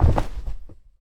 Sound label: Body hit